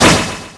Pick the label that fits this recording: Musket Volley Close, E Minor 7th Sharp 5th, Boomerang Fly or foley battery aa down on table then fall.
Boomerang Fly